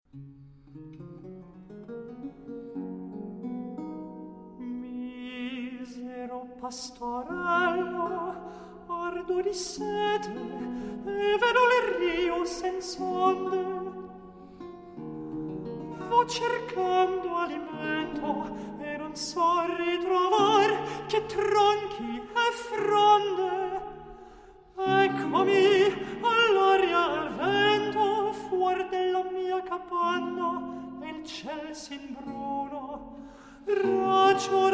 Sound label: Recitativo; FONS MUSICAE; Bononcini